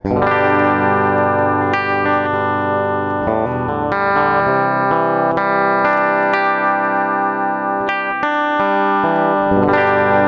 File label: Guild Surfliner clean bridge